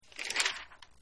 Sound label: flip photo album page; Delicious Emilys Childhood Memories